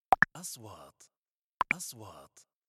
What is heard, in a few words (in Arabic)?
نغمة نقره هوائية; transitions